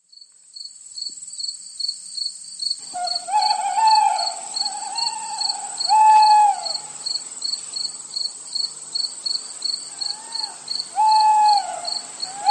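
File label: Crickets Close Tawny Owls Night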